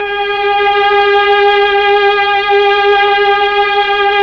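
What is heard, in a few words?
Mellotron Strings G#4, Tron Strings; Keyboards of The 60's and 70's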